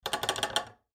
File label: Toilet Handle, Toilet Handle Jiggle Shake; Household; Bathroom